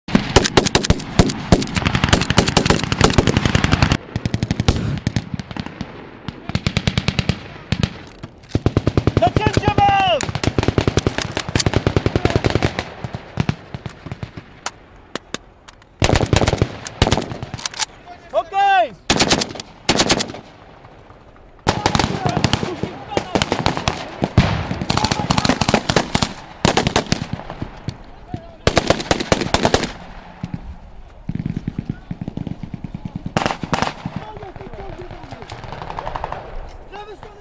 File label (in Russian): zvuk perestrelki i kriki soldat